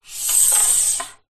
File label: metal pole slide; foley